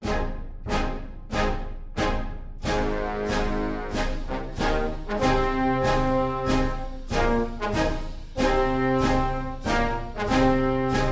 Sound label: Trio National Emblem (4 flams)